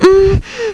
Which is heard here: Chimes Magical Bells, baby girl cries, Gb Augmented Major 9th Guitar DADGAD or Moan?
Moan